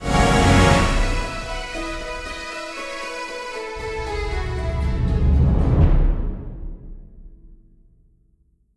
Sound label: game over; DW How to Train Your Dragon